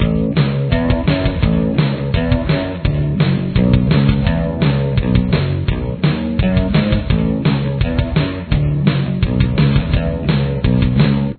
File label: full start stop bass